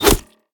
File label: roman ranged bow player hit; smite; npc; roman archer